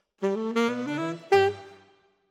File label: Alto, RNR for piano